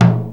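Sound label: Japanese PERCussion; USB Soundscan vol.60; Asian Traditions; AKAI; JAPAN PRC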